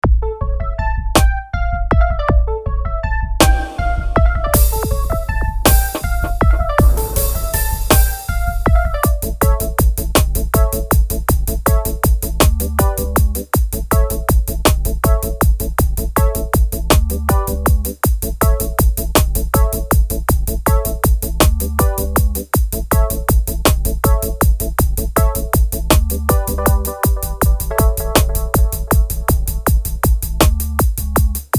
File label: dolphin groove